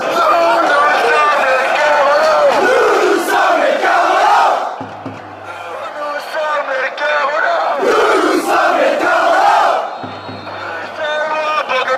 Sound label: we are the carolos, fanchants